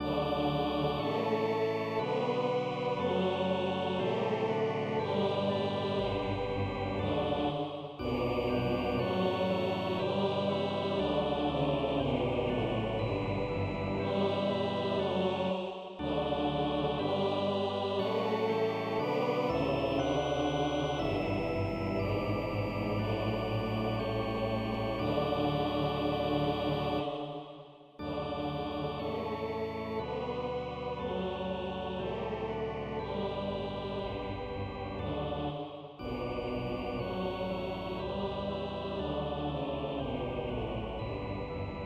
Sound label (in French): Choral, Re M, Choeur et orgue; Allemand-Francais; Bach; Choral final Passion saint Jean